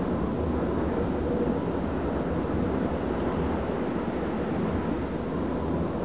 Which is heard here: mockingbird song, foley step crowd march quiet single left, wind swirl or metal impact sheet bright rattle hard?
wind swirl